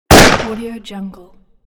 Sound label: pistol